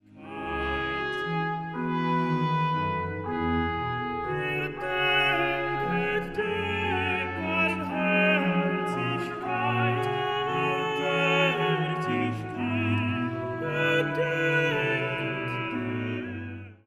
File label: duet intro; bwv10